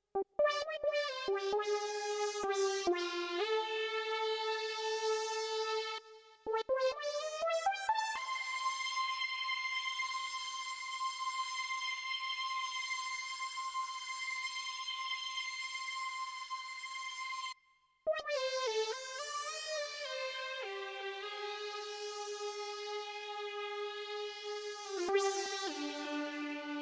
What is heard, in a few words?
Leads